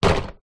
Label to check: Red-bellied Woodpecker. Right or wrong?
Wrong